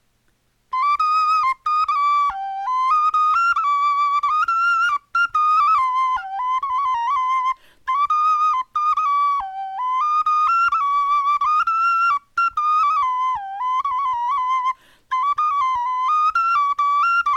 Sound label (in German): melodie